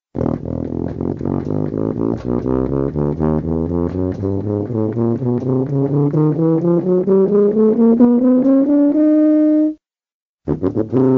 tuba

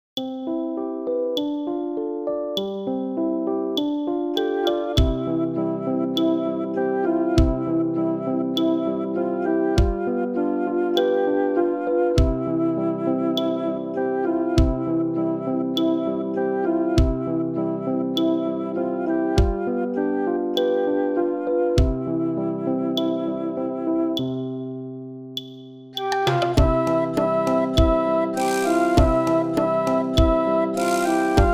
Semplice melodia

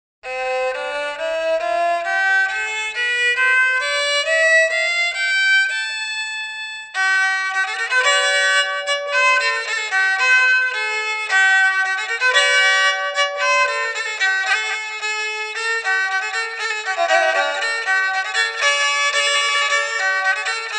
ribeca

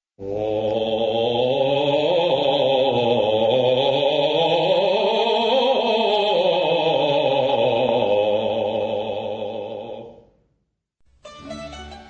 baritono

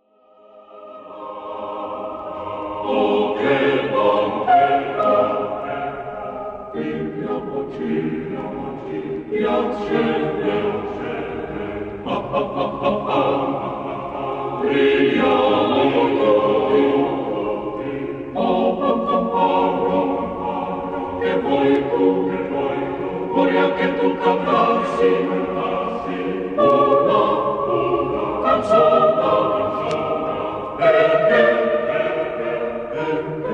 Canzonetta; Di Lasso; Sonora vol B

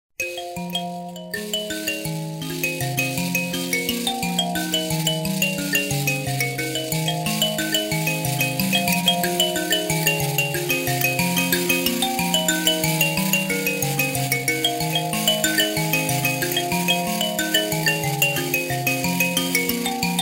m'bira

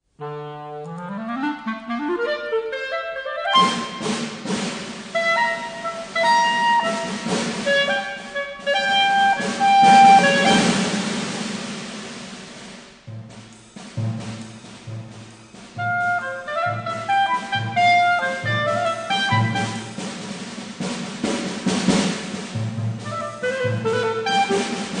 percussioni

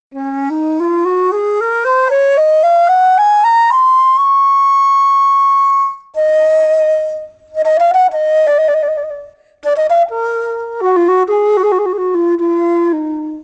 flauto traverso

